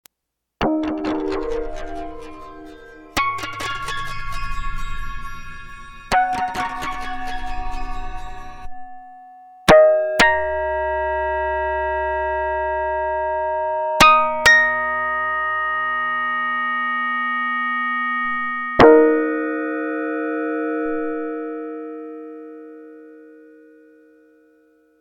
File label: SY99 harmonic